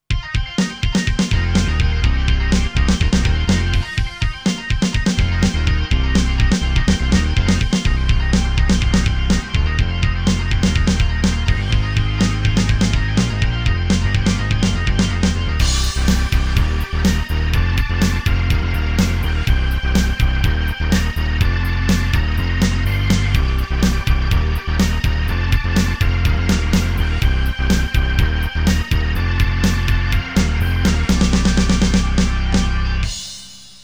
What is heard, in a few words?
B3 Organ; Masterbits CLIMAX 8